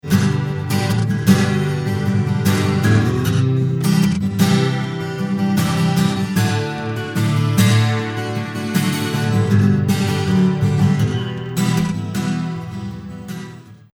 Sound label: Git Chorus